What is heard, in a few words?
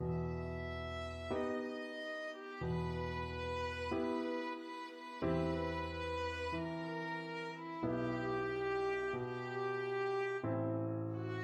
vesti la giubba violin